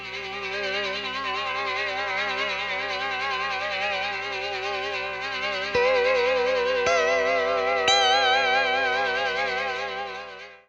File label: LAP NOISE, Guitar & Bass; Quantum Leap